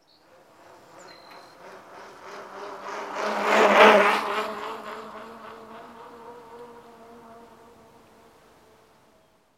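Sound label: Bicycle By Fast Speed Heavy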